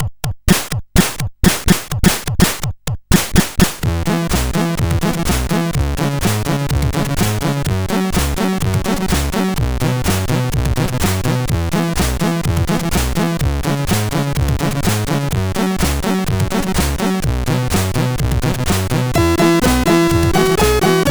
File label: Dance; Signor